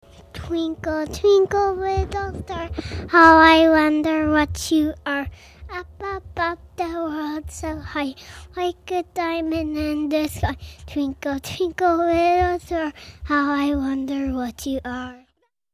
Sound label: twinkle